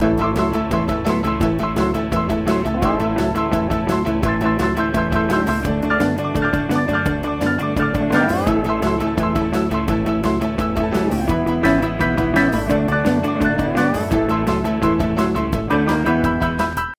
Groove Loop; Stitch; Disney Move; ps2

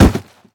hit wood; ice age scrats nutty adventure; Rapids

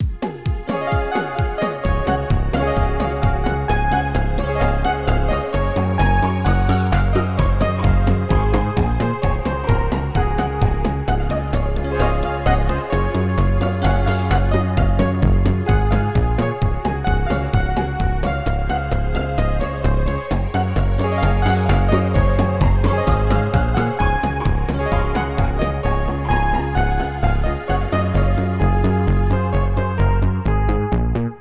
Ending Music

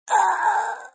Fem Death; Extra Lives

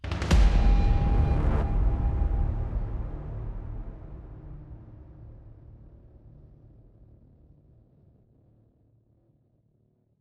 Loss; apex legends season 12; Newcastle

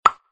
pop sound; Arc of Templar

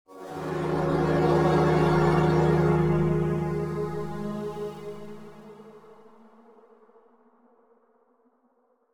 Electric wave

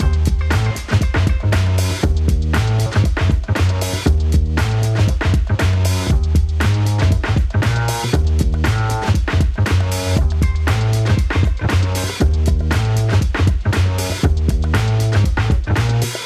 90 hiphop 118bpm; hot lava; ios macos windows

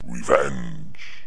got hit; Raft Wars

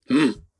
shield

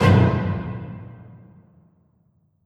Alert STING

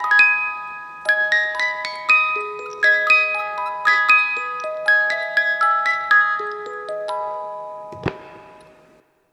Death Music

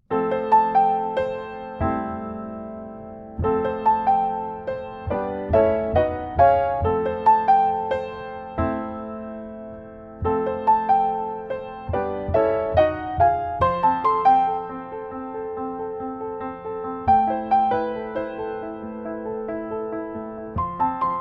Piano Version; SanctuaryRPG The Piano Collection

